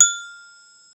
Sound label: gentle chime made with wo, sticks